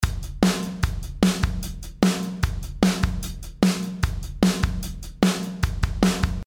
A800 Dry

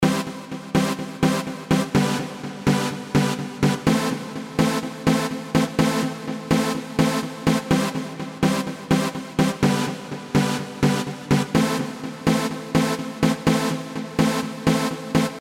Synth Only

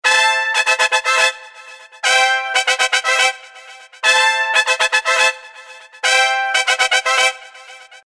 Brass Wet

